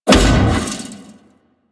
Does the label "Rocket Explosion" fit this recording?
Yes